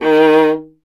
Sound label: viola D; Miroslav Vitous; Solo Instruments VOL-1